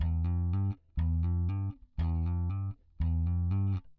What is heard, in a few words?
bass